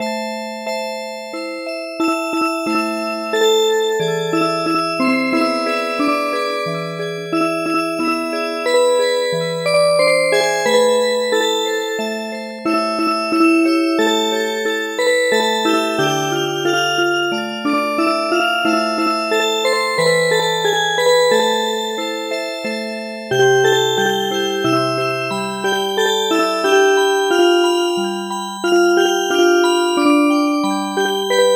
Music Box

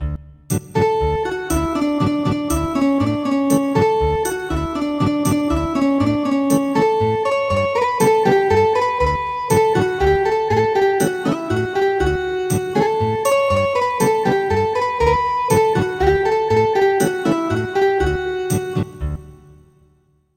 Ethnic Guitar

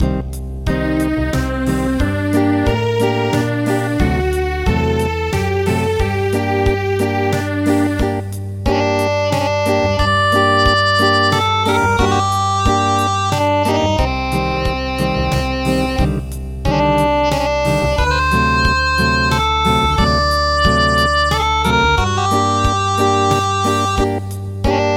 Acoustic; Dandansoy

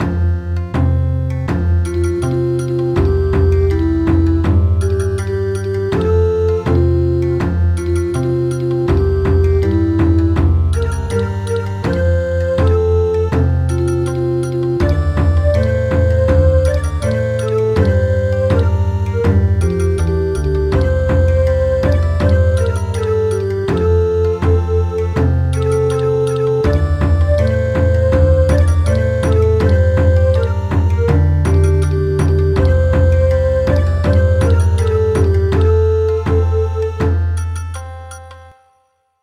Bon Odori

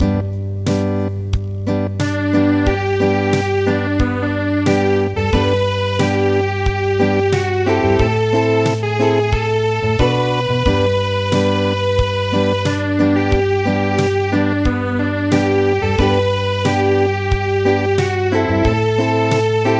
Acoustic

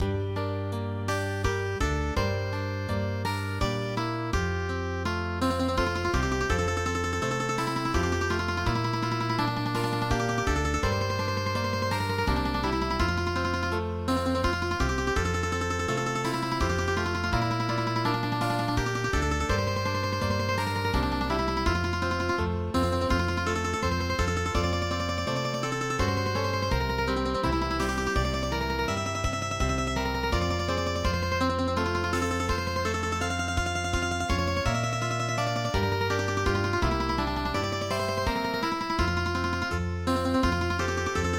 Swiss Mandolin Club; midi traditional

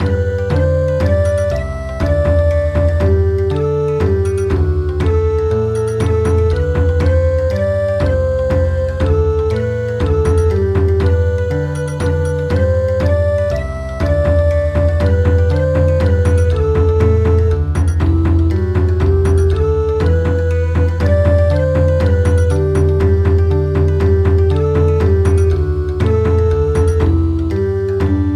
Bon Odori; We love the heroes

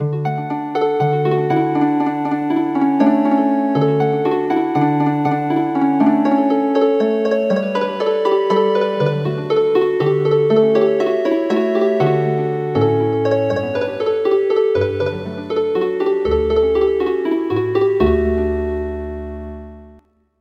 Healin Harp

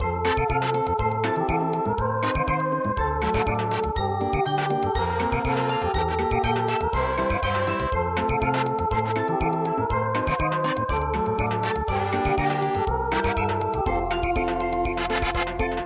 Samba

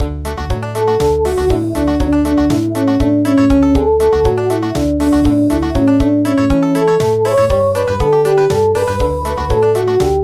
Country

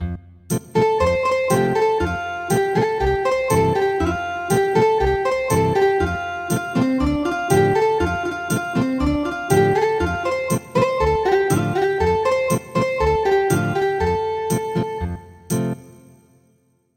Ethnic Guitar

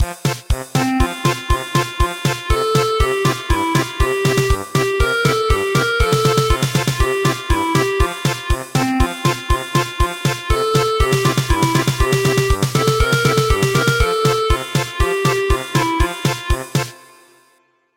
German Polka; Morning tide

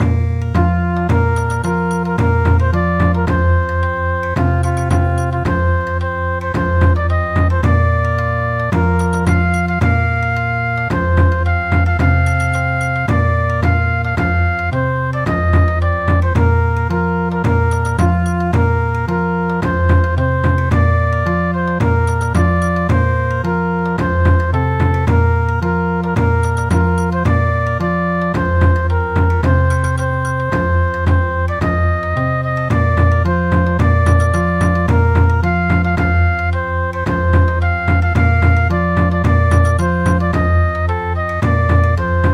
Bon Odori, midi

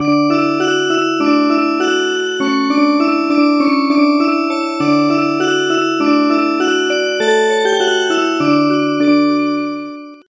Music Box

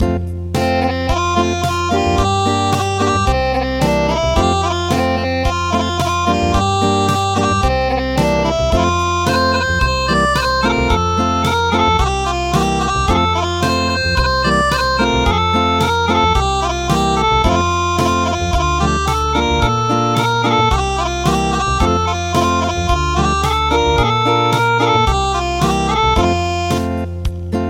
Acoustic; El Rotlleto

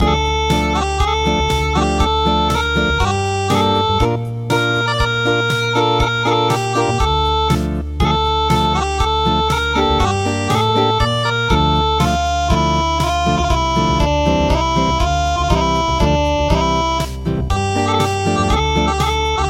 Acoustic

